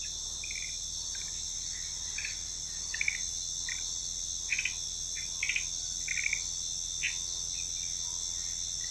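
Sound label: Boana courtoisea, frog; guyane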